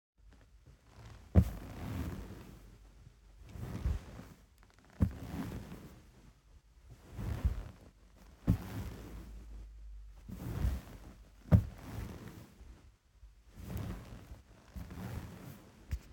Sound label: Sitting On A Couch